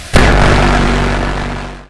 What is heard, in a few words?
Laser Core; Laserman TITAN